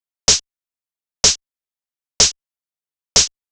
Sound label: drum fortune percussion 125, Drum Loops, Classic Chicago House